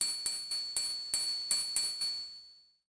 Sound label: Triangle riff